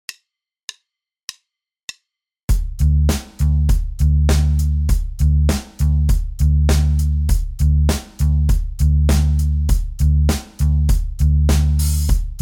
contretemps et syncopes binaires tempo 100

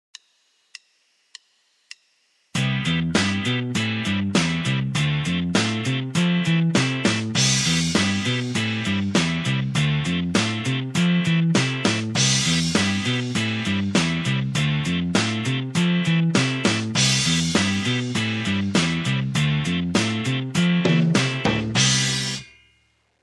arpege exercice 11 croches tempo 100

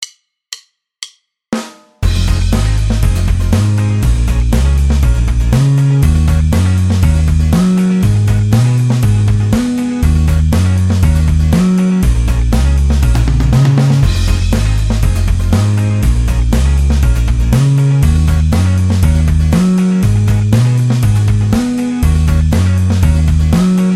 glisses

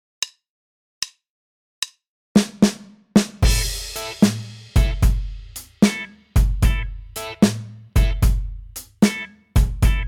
blues en la accompagnement; exercices; arpeges

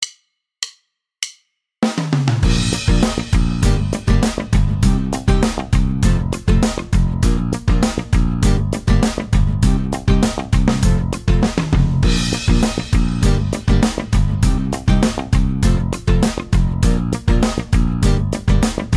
notes muettes exercice, basse; exercices

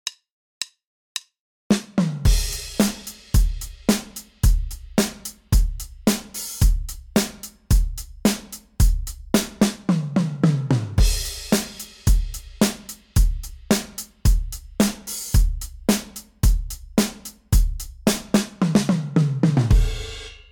5 cordes batterie tempo 110; exercices; arpeges

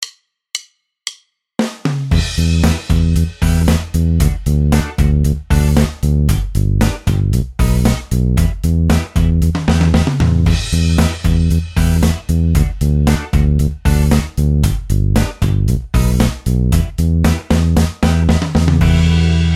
notes piquees exercice, basse; exercices

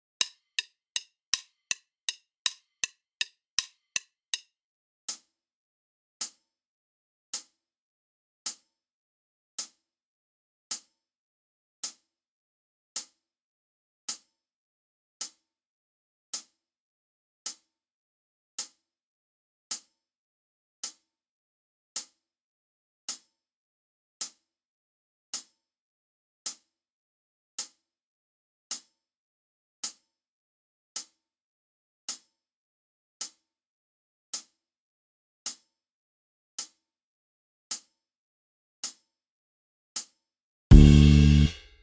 decalage triolets exercice batterie tempo 080; exercices

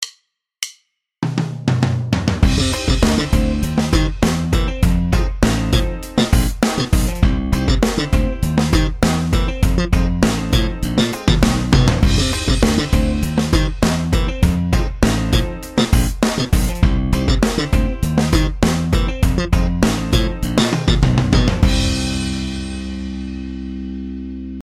octaves exercice, basse; exercices

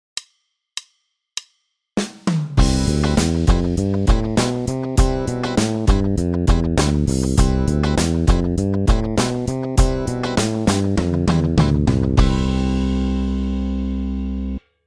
mode ionien; exercices

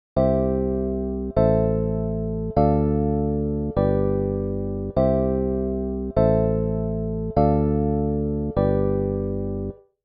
ligne accords avec renversements et basse; exercices

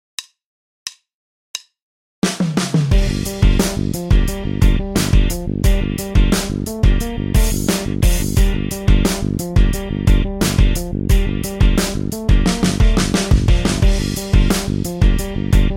tapping

